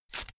velcro large open fast

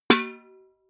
metal bucket hit ring hard

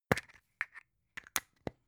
plastic spray can small open close

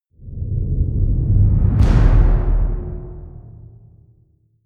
big drums

